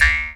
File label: jaw, Didgeridoo and Other Primitive Instruments; Big Fish Audio - Didgeridoo and Other Primitive Instruments; JAW SIMPLE